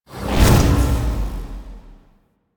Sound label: Magnetic Field Cast; Warframe; Focus; Unairu